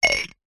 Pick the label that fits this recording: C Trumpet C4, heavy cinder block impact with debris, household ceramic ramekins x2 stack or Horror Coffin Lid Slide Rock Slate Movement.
household ceramic ramekins x2 stack